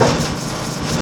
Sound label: MACHINES